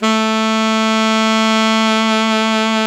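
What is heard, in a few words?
SAX ALTO mezzo forte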